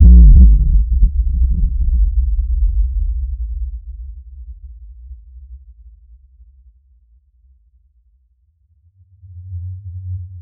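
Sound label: deep vibrating extra bass, explosion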